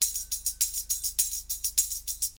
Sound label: drumloop